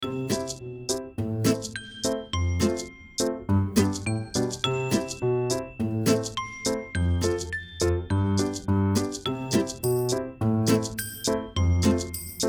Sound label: loop